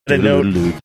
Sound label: singing funky bass